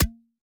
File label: Water Splasher